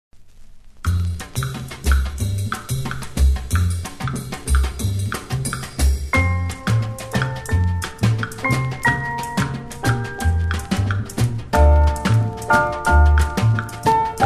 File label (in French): mazurka